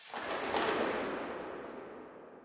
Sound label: amb wing flap, birds; video games; ambient; nature; source engine; portal2